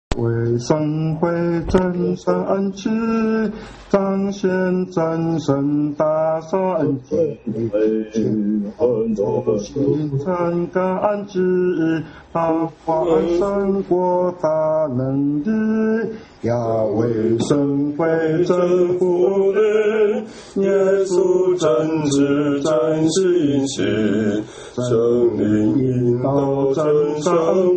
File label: hymn; worship